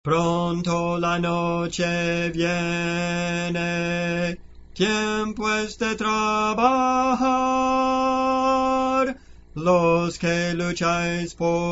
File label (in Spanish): Tenor, Cantos Espirituales